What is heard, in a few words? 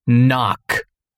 knock